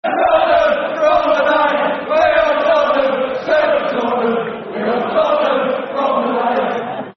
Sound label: Football Chants (Soccer Song); We Are Tottenham; Tottenham Hotspur Thfc